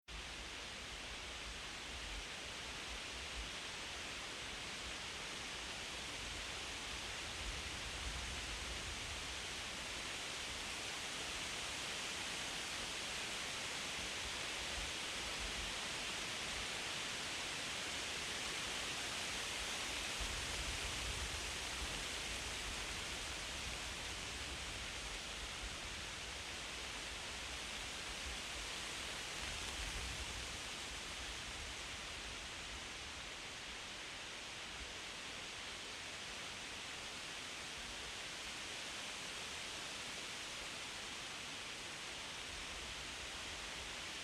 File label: Nature Heavy Wind In Trees Night Leave Rustling Falling To Ground